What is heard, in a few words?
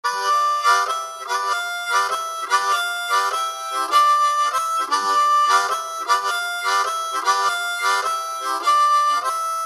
Tongue, harmonica